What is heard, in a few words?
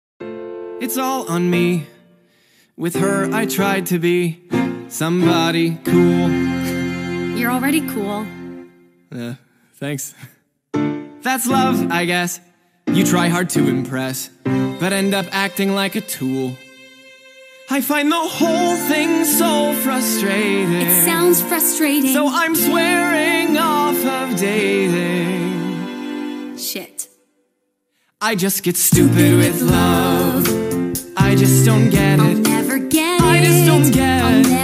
vocals; Mean Girls